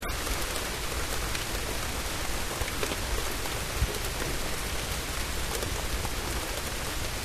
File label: rain